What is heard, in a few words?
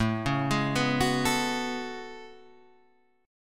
A Major 13th, down Guitar Standard; arpeggiated; capo=4